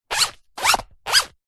foley bag leather small handbag or pouch front compartment pocket zipper; zapsplat